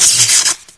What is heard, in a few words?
new spark, energy; source engine; ep2